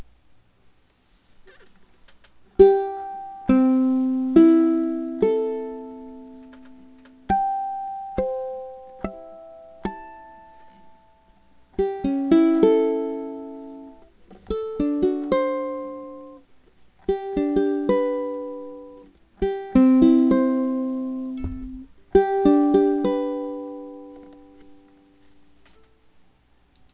conc note, ukuleles

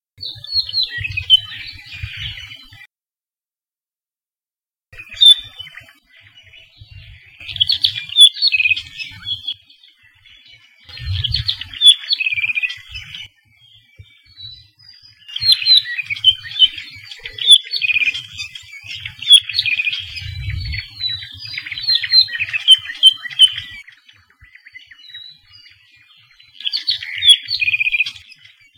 apapane long; Saddle Road Birding

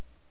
6 tnr str, ukuleles

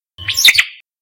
omao call; Saddle Road Birding

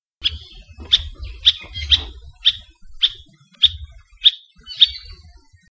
elepaio; Saddle Road Birding

